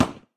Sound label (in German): waffen schuss; minecraft